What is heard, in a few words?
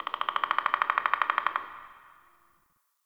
Forest Bird Mid, Ambience; Warframe; Grineer